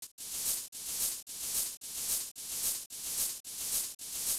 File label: reverb loop